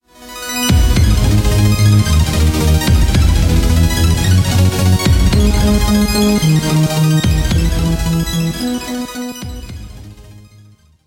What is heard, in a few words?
rainbow theme